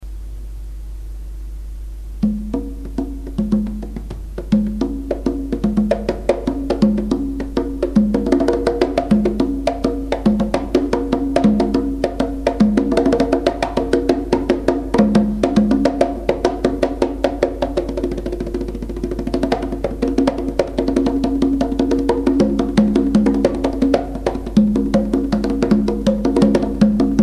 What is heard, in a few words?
conga rumba solo